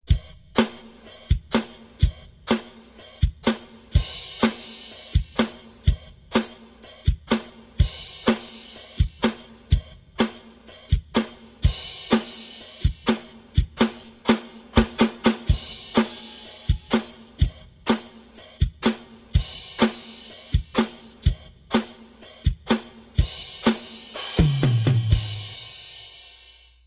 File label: rhythm